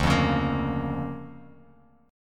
D Minor Major 7th Sharp 5th down Bass Standard, chord; DmM7#5 strummed; JGuitar